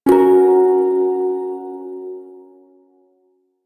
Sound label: Prime Shawzin Yo Chord I; Music; Warframe